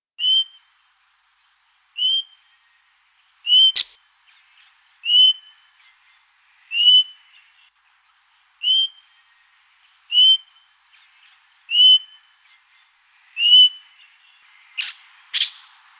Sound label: aust King Parrot; cockatoos and parrots; australian king parrot; canberra garden birds